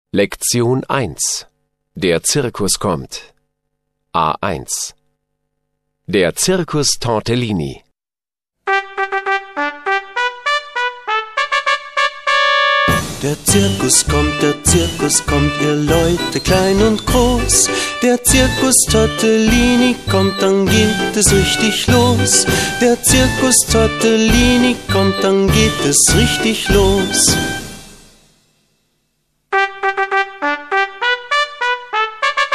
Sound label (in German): lied